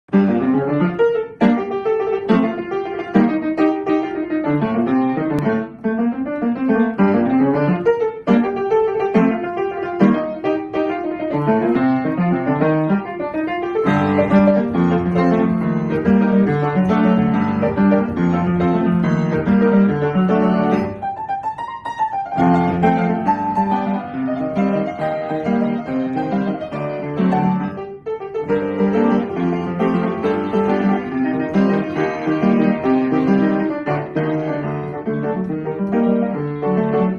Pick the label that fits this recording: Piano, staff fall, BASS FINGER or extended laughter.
Piano